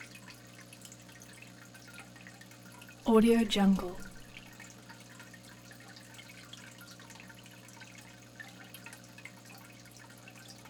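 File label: ambience fish tank motor; prosoundfx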